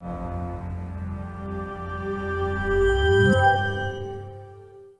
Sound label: Startup